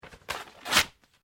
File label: PAPER SHEET TEAR QUICK; Material components for constructing more complex sounds; Sound Effects:Materials